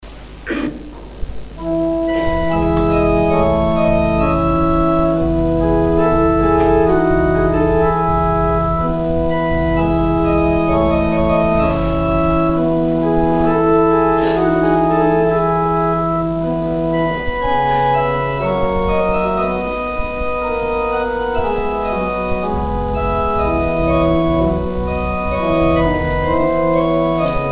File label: organ interlude